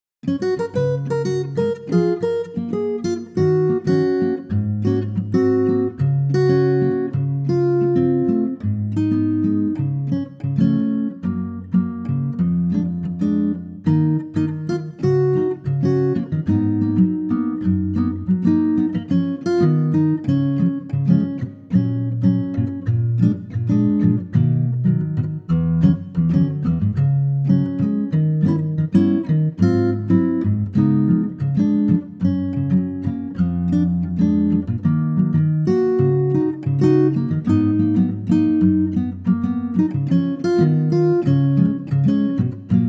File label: latin Acoustic Gtr Gb